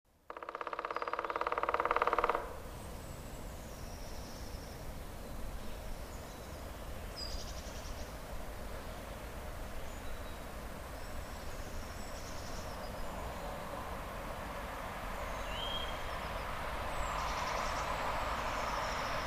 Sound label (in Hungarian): Fekete harkály